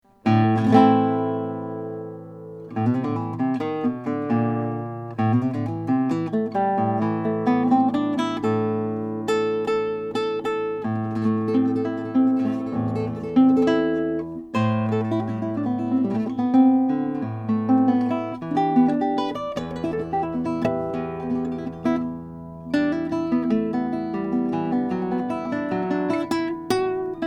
greenfield c2 flame rock maple

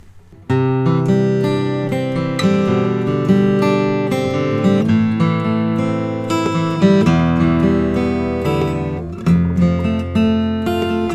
walnut grand concert; goodall

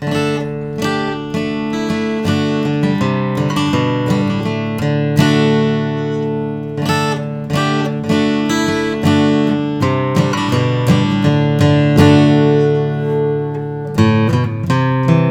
martin custom j 45 alpine bearclaw

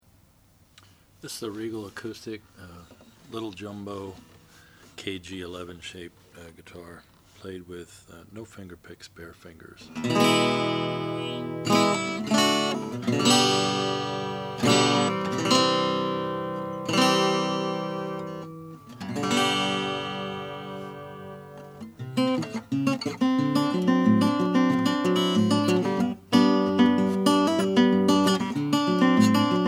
regal junior jumbo natural sunburst regal

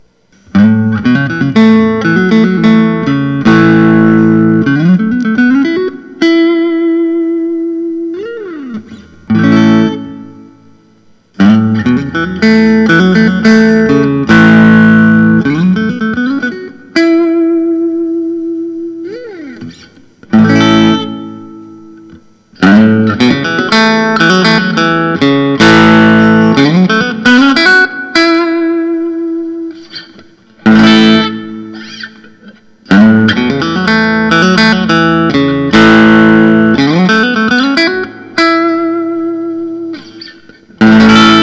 fender telecaster vintage blonde

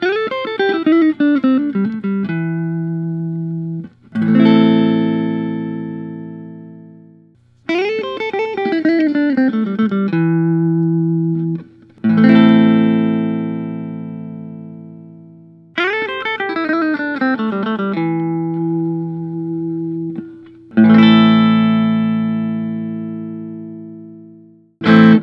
don grosh retro classic hollow

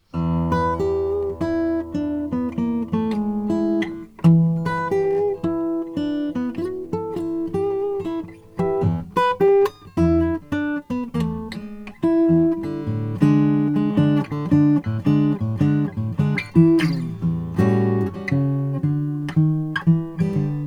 froggy bottom h12 custom mahogany

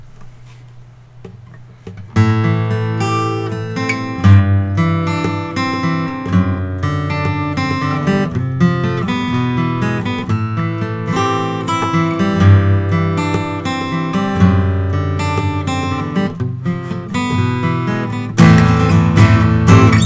greenfield gf; natural

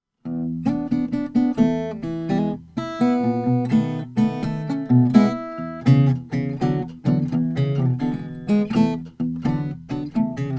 lowden wl50 hondur

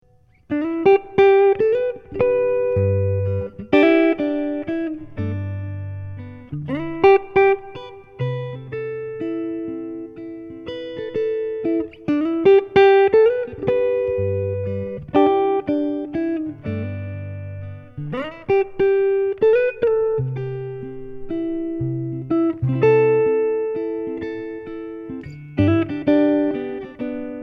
marchione 15 archtop red

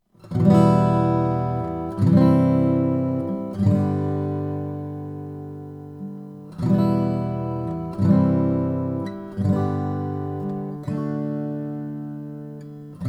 Square Deal guitar macassar

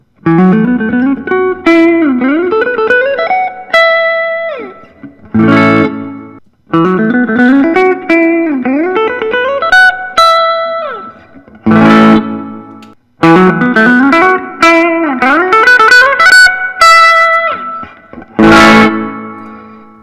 gibson memphis 63 es 335 reissue

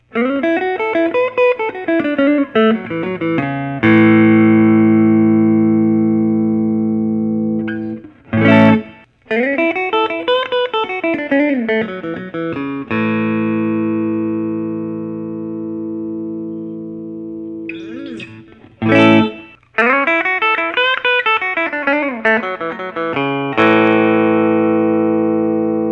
gibson historic 56 les paul

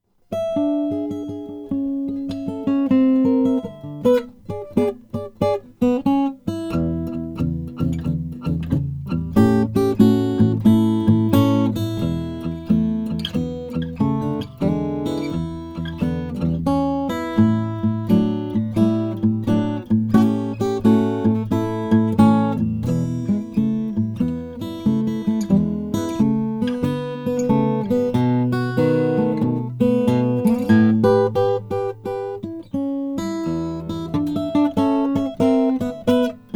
borges om 45 brazilian rosewood

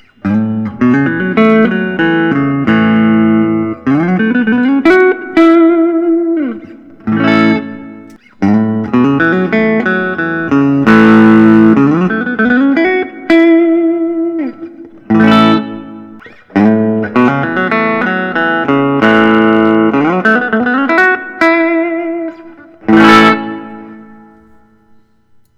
gibson les paul standard lemonburst

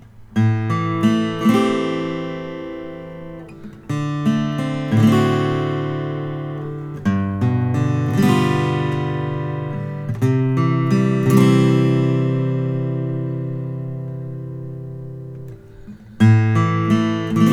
martin custom 00 14 koa

